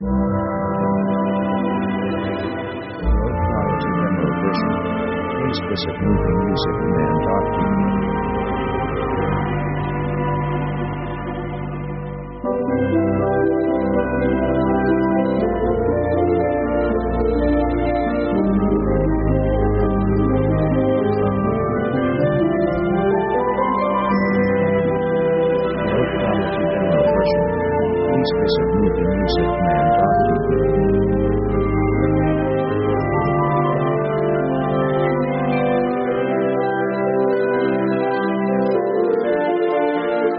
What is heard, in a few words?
friendly flowing